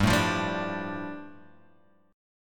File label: G 7th Suspended 2nd, Guitar Half Step Down